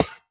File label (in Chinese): 试炼刷怪笼踩踏声